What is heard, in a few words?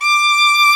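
STR violin, Strings x4, Roland; Str Quartet; Club-50 - Foundations Roland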